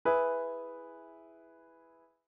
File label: Minor Chord Progressions Example, E major